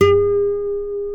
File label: A NYLON, Guitars